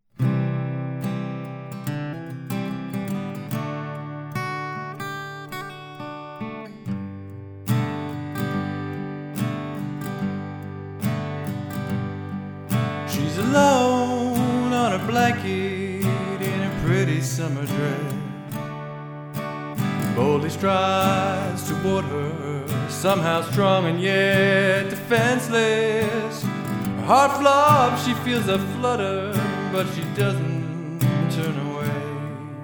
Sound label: acoustic